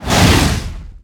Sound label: Flame Spit Fire; Destruction